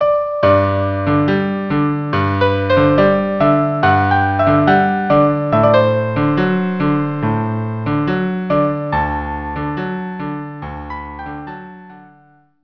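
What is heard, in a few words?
la paloma violin cello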